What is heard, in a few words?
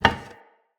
Vase Medium Impact; Vases